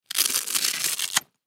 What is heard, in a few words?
Briefcase Pocket Velcro Open; Miscellaneous Office; Blastwave